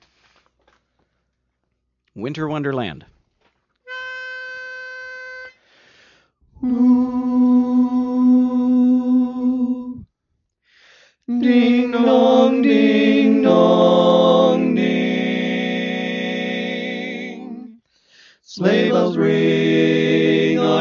4 part; Christmas

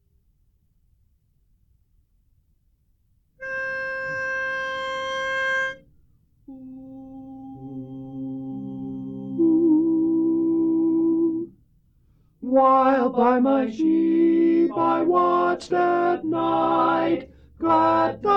While By My Sheep - Tenor; Christmas

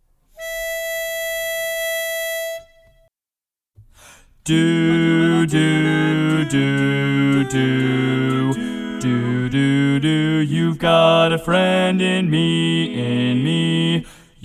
Bass mono in E

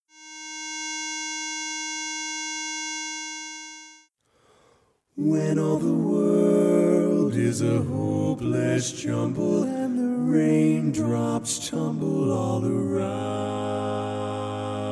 Lead missing

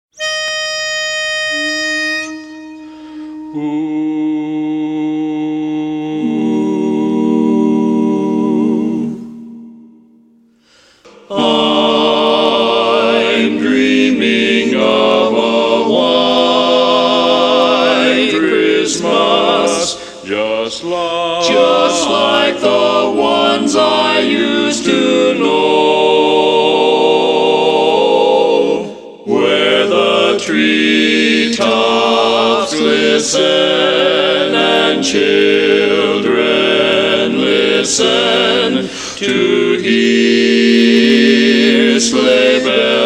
tenor missing; Christmas

